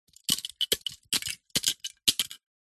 leisure toy metal cars small handful drop set down carpet